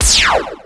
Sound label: ROCK